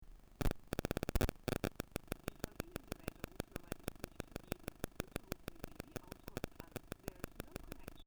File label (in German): Oktava MK012 Einstreuung Mobilfunk 5cm